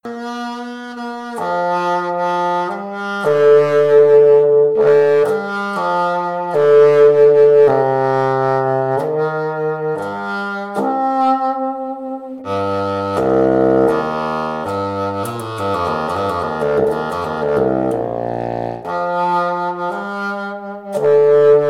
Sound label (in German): B Fagott